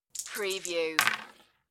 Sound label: Dice Roll